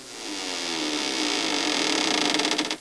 door creak, old wooden door creak

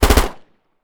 9 mm smg multiple shots

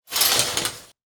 window curtain pull; cover

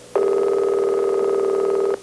phone ring; corded

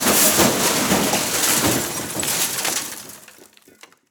debris crash

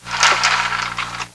blind up

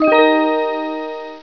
BEEP

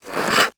ice skate; hockey

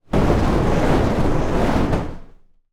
flame burst, flame jet under pressure

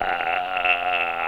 zombie, zombie growl

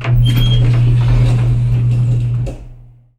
Elevator door open

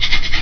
SCRIBBLE

